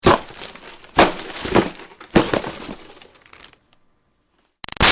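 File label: Foley Box Hit Christmas Present Crash; Crinkle; Hits; Xmas; Objects; Big Room Sound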